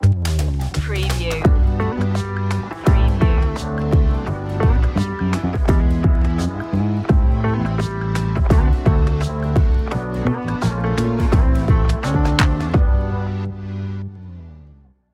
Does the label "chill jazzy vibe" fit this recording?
Yes